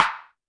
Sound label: Snare, Roland TD-20